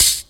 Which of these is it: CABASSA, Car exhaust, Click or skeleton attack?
CABASSA